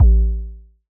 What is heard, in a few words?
electronic kick distorted; SoundsCrate